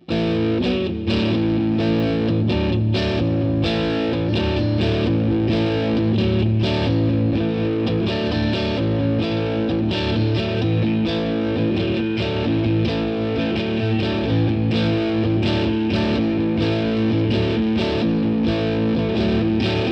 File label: Pedal